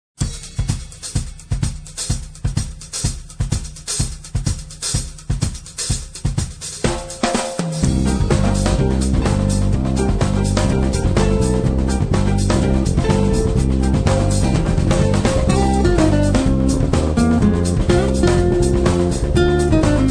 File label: dinner jazz